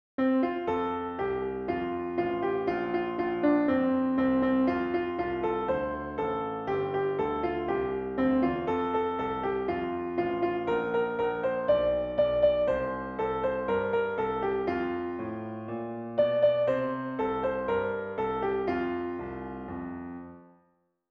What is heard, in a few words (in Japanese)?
ピアノ